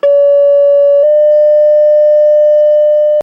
Two tone fire

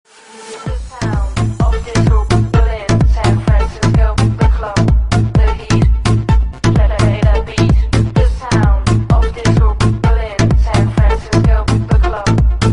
House Ringtone

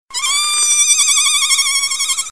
whine; ringtones

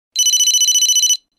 business tone; ringtones

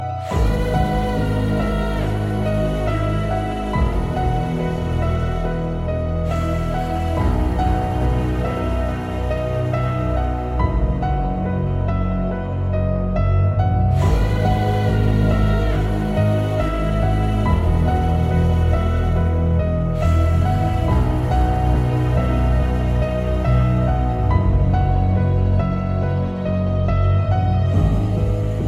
tune, electronic ringtones

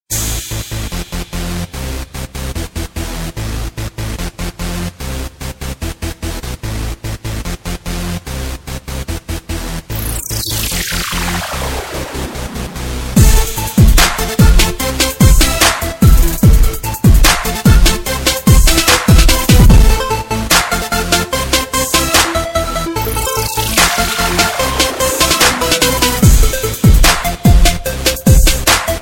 hip hop beat

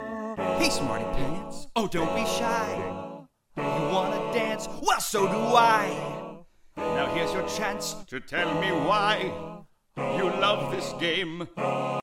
ocarina

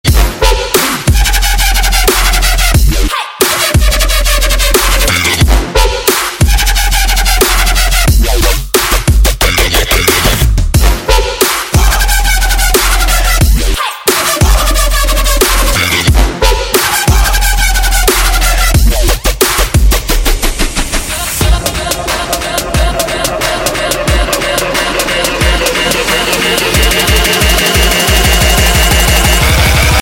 beat dem up

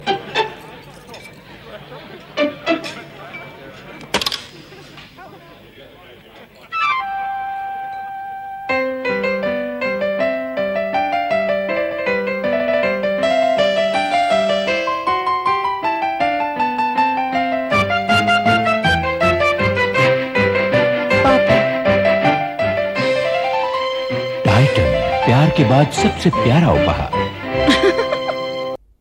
piano version, ringtone; titan; blues

